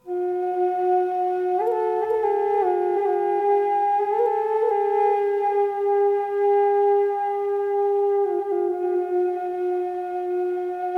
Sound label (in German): F AEOLIAN 440 mit delay, drone flute indianerfloete doppelfloete; aromatic cedar